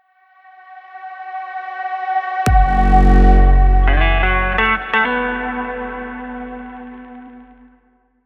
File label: stylish beauty lifestyle downtempo